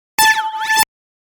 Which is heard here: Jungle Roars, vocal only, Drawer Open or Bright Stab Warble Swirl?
Bright Stab Warble Swirl